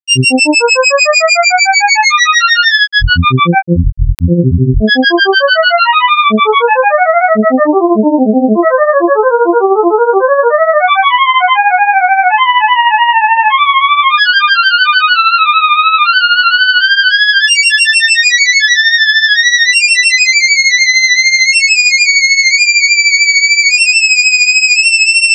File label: quicksort gran